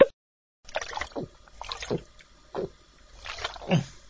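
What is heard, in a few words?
popcorn coca cola muffled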